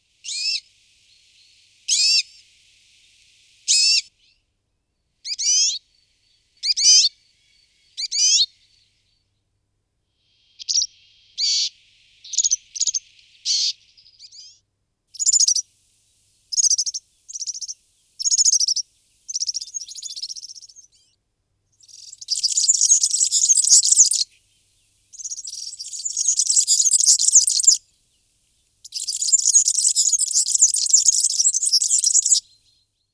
Zvonohlik zahradni Serinus serinus

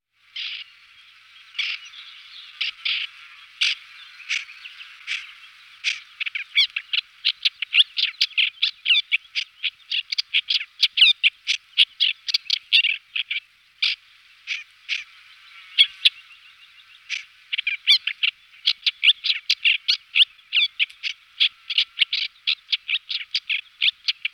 Tuhyk belocely Lanius nubicus

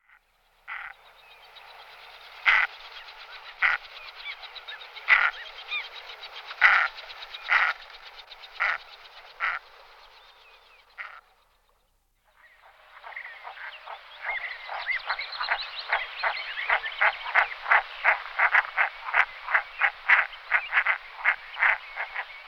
Morcak velky Mergus merganser

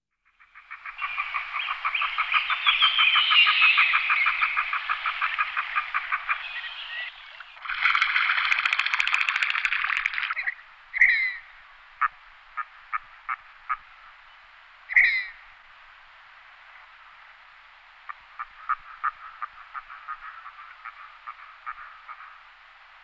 Morcak prostredni Mergus serratos, nasiptaci

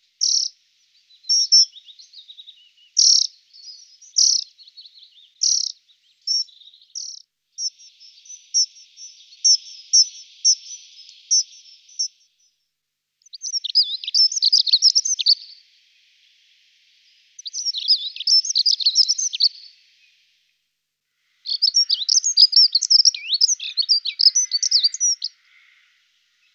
Pevuska modra Prunella modularis

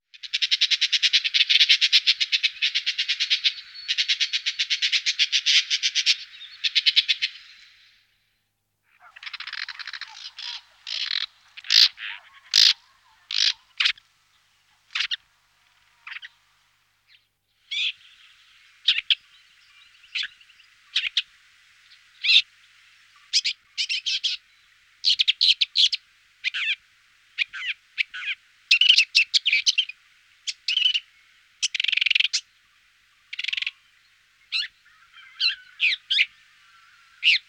Tuhyk rudohlavy Lanius senator

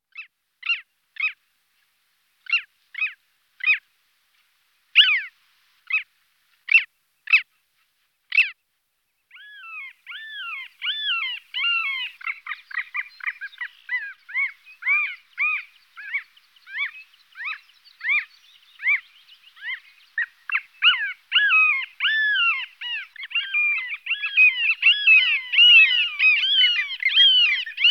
Chaluha mala Stercorarius longicaudus, nasiptaci

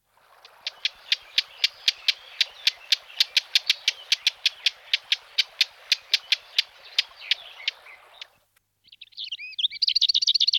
Penice pokrovni Sylvia curruca

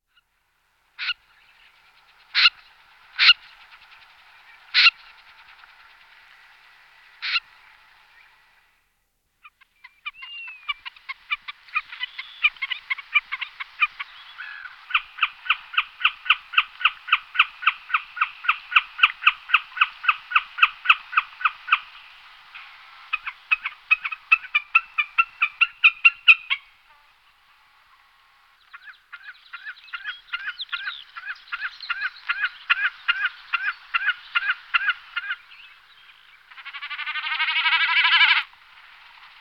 Bekasina otavni Galllinago gallinago

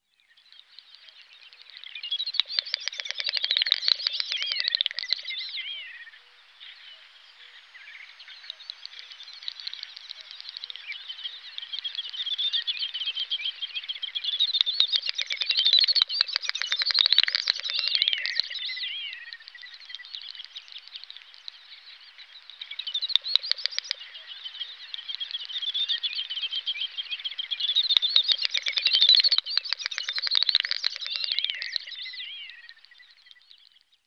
Bekasina vetsi Gallinago media, nasiptaci

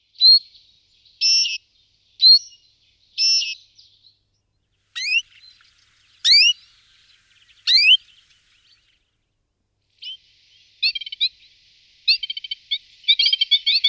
Zvonohlik citronovy Serinus citrinella, nasiptaci

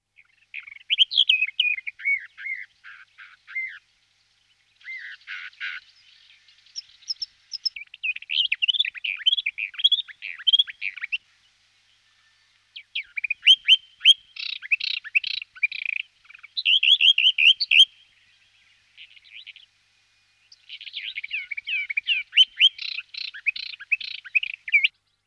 Penice dlouhozoba Sylvia crassirostris

